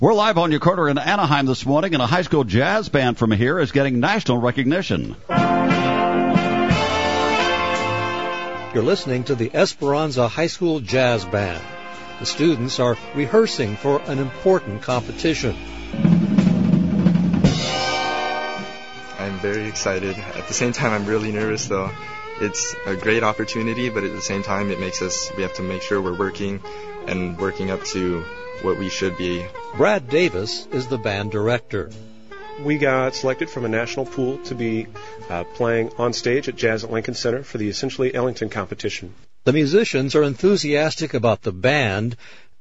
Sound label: Jazz Band segment